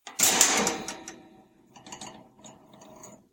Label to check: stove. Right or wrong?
Right